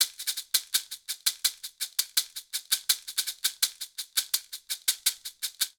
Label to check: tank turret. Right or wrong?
Wrong